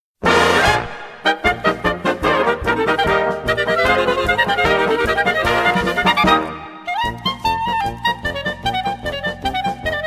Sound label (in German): Schottisch